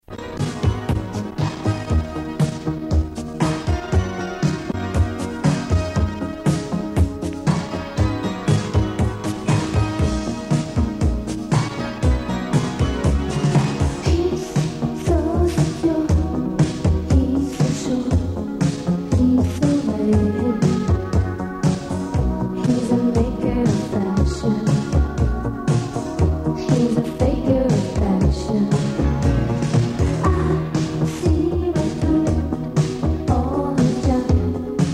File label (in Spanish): Música instrumental